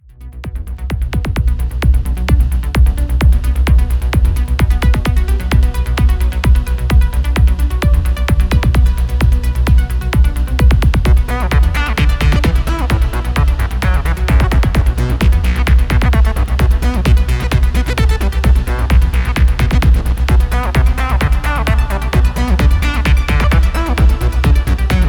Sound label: Psy trance D&B&SYNT